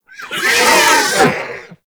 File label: Snow Critter Vocal Death; Hunting Critters